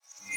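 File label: Battery Indicator-Machine Light-On Reverse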